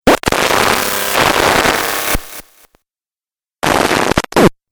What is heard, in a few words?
Dubstep Scifi Effects